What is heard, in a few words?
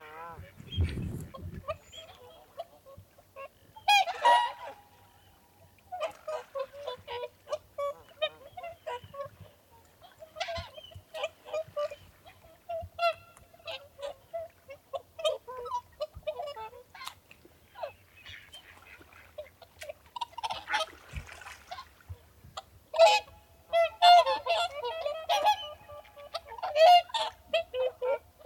Whooper swan